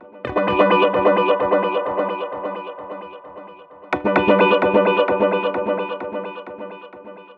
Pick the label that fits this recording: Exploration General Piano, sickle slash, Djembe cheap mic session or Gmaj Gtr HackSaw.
Gmaj Gtr HackSaw